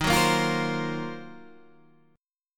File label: Eb Minor 6th Add 9th Eb down Guitar Standard; strummed